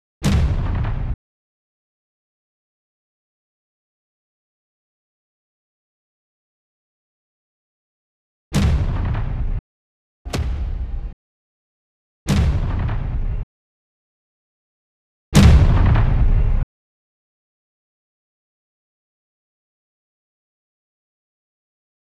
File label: 120bpm booms